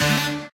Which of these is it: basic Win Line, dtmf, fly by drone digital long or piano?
basic Win Line